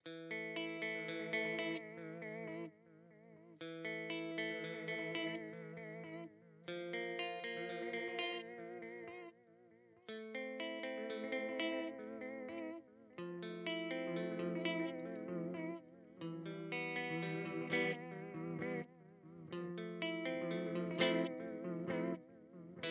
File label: Way Huge Supa Puss Modulation